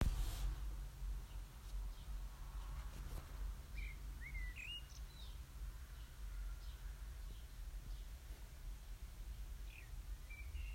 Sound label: black bird